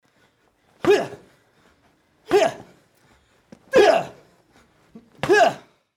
karate fight

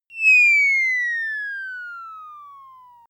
bomb drop

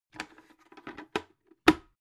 bucket handle use pop

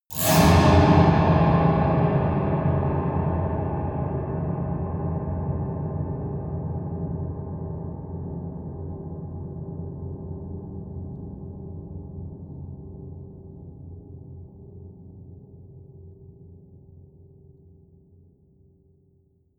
piano twang multiple fast long

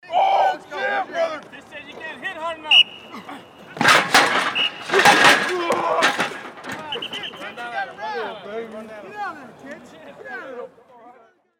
football sledge training

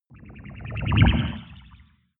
whoosh storm twitter short

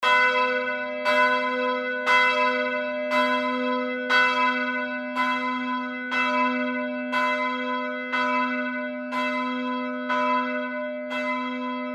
church bell high pitch

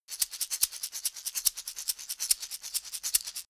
shaker wooden fast short

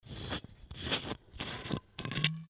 bottle open

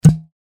drinks wine bottle opening plopp

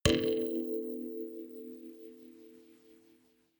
notification kalimba rattle body hit

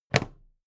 plastic clasp close heavy

